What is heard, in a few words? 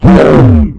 sentien pain; Zaero; monsters